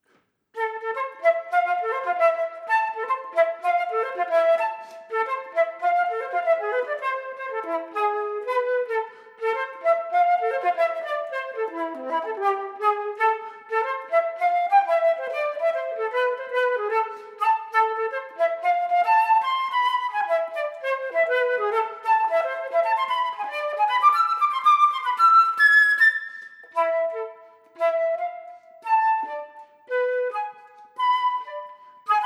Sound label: Flute Latin Dance performance; Flute Grade 7; Buckland